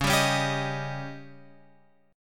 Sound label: C sharp Suspended 2nd Flat 5th C sharp, chord